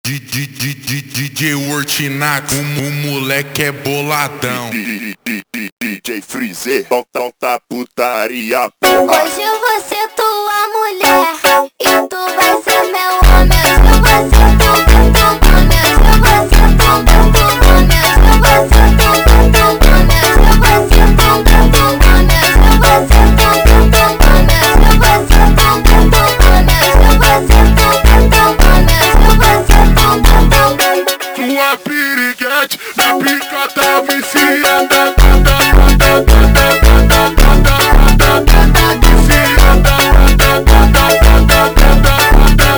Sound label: Montagem super Slowed